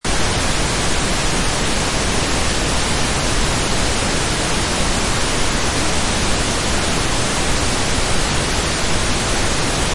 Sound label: pink noise